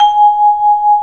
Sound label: bell, 3D Vibes Hyb; E-MU Producer Series Vol. 5 – 3-D Audio Collection; 3DSprints